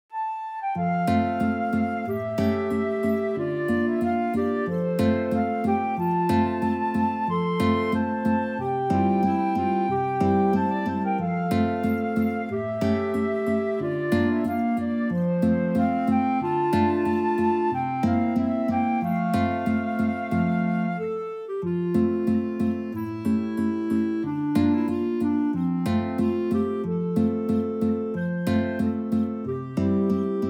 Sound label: naration backing; MTWFC VOL1 Audio Accompaniments